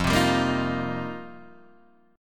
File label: F 7th Suspended 2nd Suspended 4th F Guitar Open D